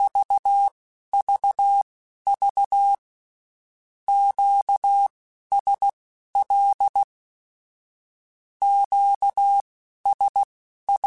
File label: Q Calls Twice spaced, Morse Code; CW Code Groups; HamRadio